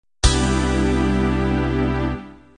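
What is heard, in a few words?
standard 16 beat end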